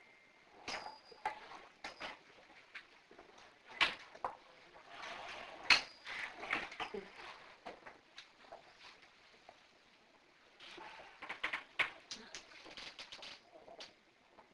coin drop

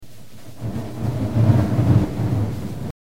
Demonic Growl Almost Sounds Kinda Like Thunder

